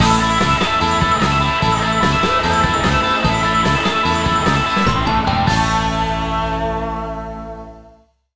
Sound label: stacked Bonus High; wild water